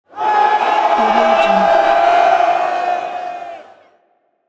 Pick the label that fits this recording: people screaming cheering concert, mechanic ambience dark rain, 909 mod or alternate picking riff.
people screaming cheering concert